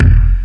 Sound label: Kick